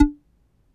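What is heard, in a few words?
tongue ram, flute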